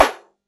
staff swing; Character Actions

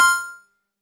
secret found; Game Events

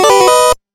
trade offer, multiplayer social

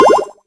bubble pop, platformer

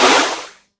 ice floor; Traps & Hazards; freepixel

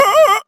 attack shout; Character Voices

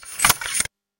wild card; Cards & Board

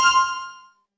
holy magic

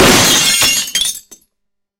shield break; WordJourney

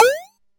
shop sell; NPC Dialogue

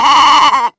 sheep baa; Farming

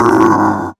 wolf growl; Creatures

